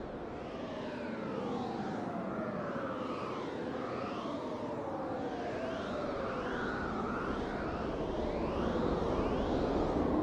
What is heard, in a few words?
Weird Wind Loop; Diner; Silent Hill Downpour